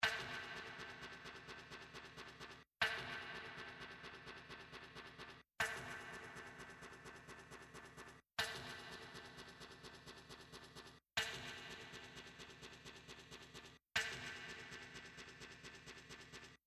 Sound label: Loop